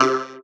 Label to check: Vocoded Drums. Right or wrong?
Right